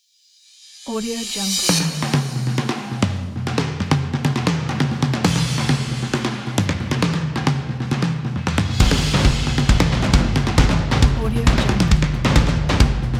drums intro

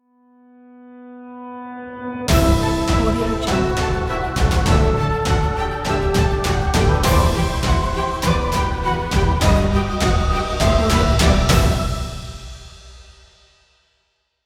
heroic logo

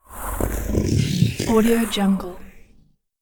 creature small growl

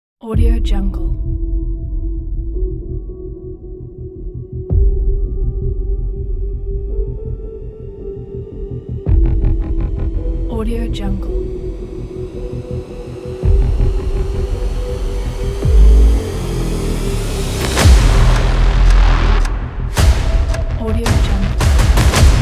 cinematic action teaser; hardprosound

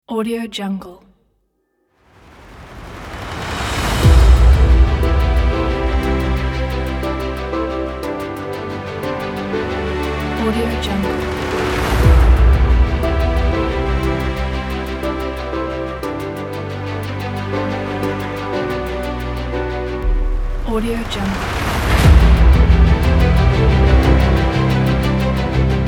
danger epic

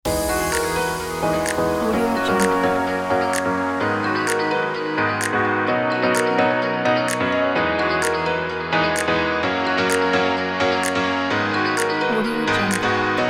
dance event; magmasounds

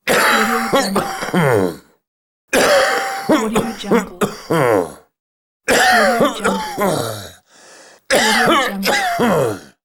cough

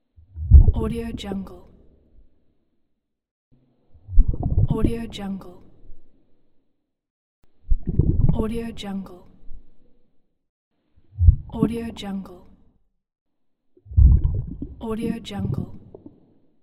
underwater deep bubbles

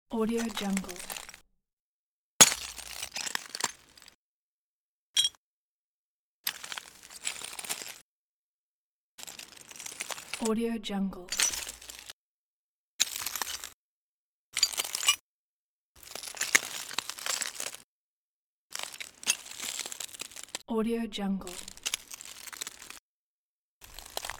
breaking glass

